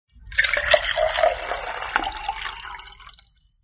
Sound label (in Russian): Жидкость наливают в стакан